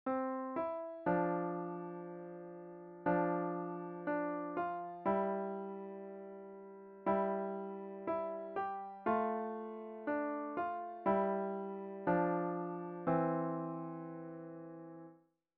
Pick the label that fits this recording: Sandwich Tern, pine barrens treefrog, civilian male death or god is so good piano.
god is so good piano